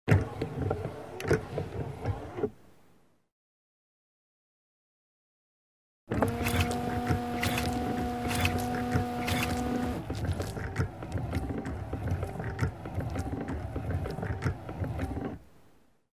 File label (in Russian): Avto dvorniki zvuk podachi vody